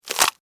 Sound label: Insect, Eat, Bite, Bug; Animals:Insects; Insects Eating; Blastwave